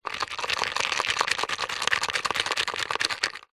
foley gems jewels stones in small container shake

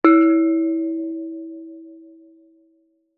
musical instrument bonang gamelan hit soft

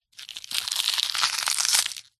animals insect cockroach crush squash crunch

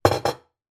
jug glass down on kitchen surface; household

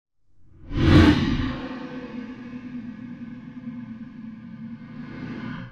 Whoosh Vocal; bottlerocket

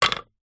toy train wooden track piece place down

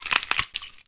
foley footstep broken glass single; zapsplat

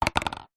household cup plastic empty knock over table; zapsplat

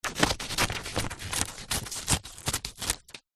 office scissors cutting paper; zapsplat

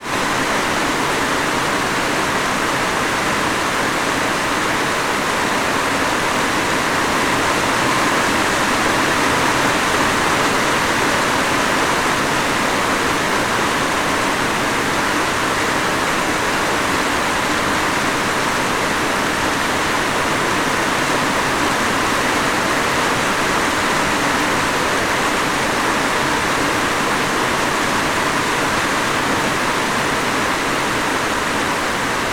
nature rain heavy tropical distant thunder; thunder at 54 secs

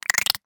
science fiction alien vocalisation clicks; zapsplat

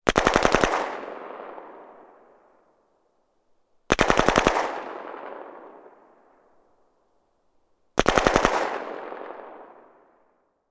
gun ak 47; still north media

